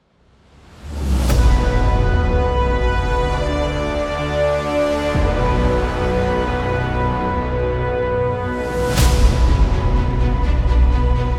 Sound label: Cinematic Trailer; motivational epic music